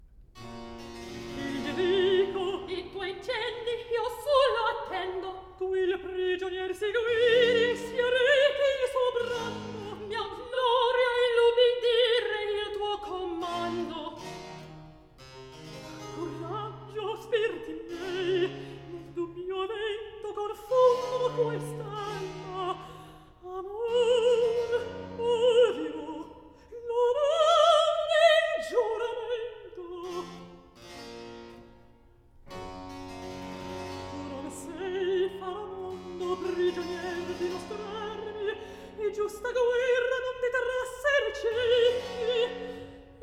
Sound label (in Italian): Recitativo; Childerico; Handel Masterworks; Klassika